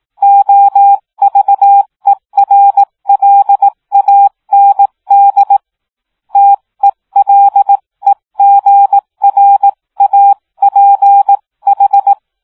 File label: morse, Telegraph